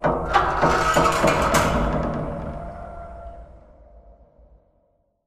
metal stress med; Titanfall 2

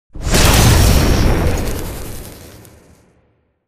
intro whoosh; Titanfall 2

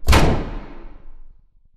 sliding door sm verb close start; beacon; Titanfall 2

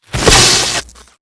hardpoint electricity; Titanfall 2

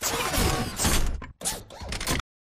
pain knockback left; Titanfall 2

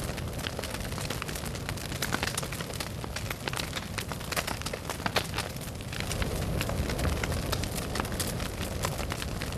fire loop medium crackle; colony; Titanfall 2

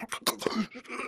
spectre chest punch idle grunt; Titanfall 2

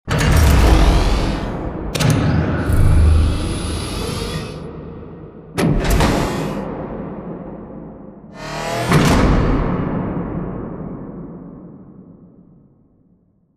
Giant Floor Panel Arrive Rotate Lock Mech; Boomtown; Sideways Town; Titanfall 2

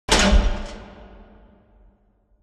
Assembly Conveyor Stop Close; Boomtown; Titanfall 2

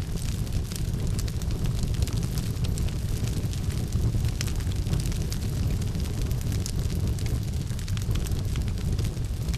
fire loop small flame; colony; Titanfall 2

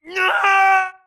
grunt death crush; Titanfall 2

